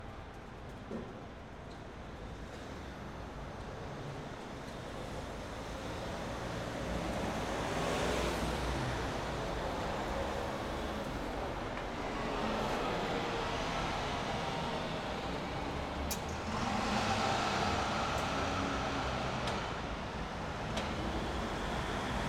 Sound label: City Brussels Street Morning